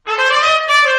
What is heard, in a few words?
trumpet danger; music